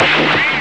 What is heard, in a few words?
gunshot; ST-XX Amiga Soundtracker Sample Packs